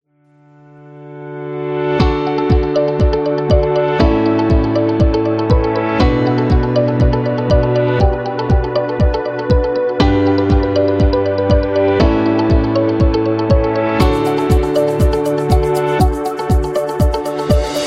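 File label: Food Industry, Upbeat and happy background music; cooking show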